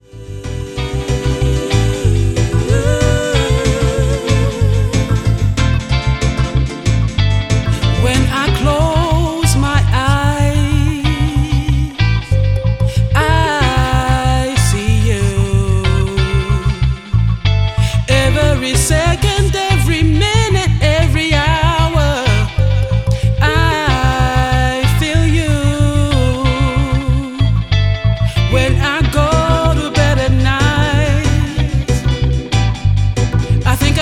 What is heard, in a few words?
Vox Dub Snip